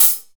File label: HAT; Ensoniq ZR-76